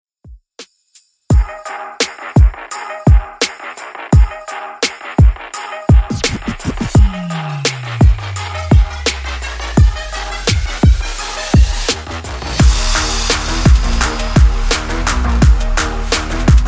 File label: Top40 Redrum 85bpm